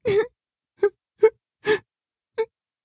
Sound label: Crying